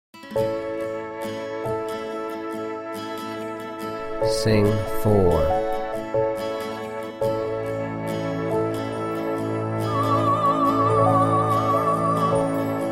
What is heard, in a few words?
Soprano Key of A; applied ear training; Practice Perfect; Contemporary Orchestration Music Ear Training